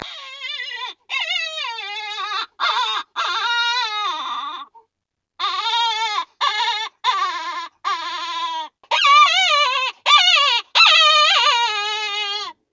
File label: Predator Hare; Nordik